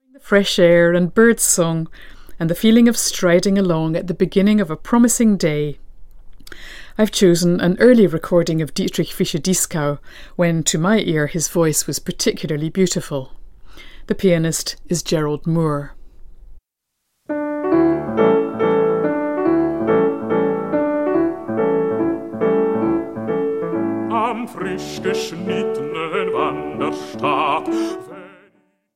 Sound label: lieder for voice and piano; hugo wolf; morike